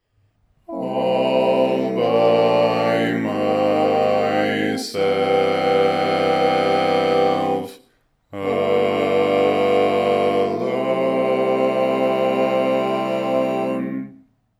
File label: 5-part All Parts, Barbershop Tags